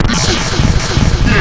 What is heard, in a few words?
VOX FX; Big Beat Samples & More